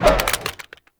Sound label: Water Balloon Fire